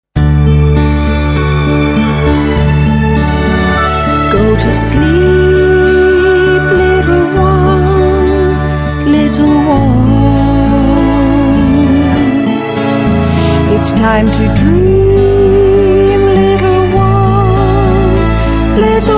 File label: Lullabye; Bears